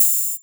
Hi Hat, One Shot Drums; Sonic Foundry (Sony Creative Software); Knutrix Drum & Bass

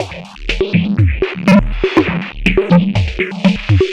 Drums Strange; Sonic Foundry (Sony Creative Software); Machine Language

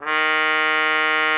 cup D#3, cup mute; Xsample VOL-3

